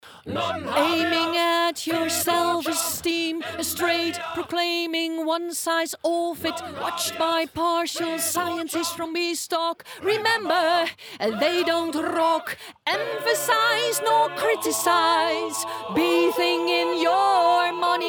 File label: Vox All Choir; Tutti met lead